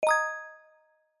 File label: point won